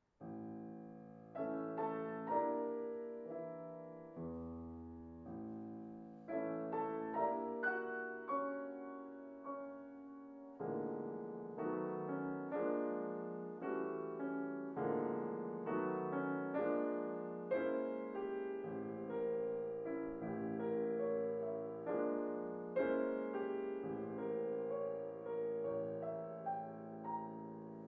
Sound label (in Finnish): melankolinen valssi